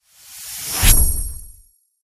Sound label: substitution symbol enter; shangrila